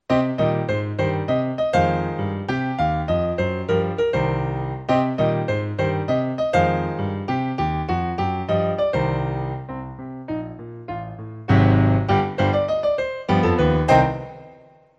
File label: jazz blast duet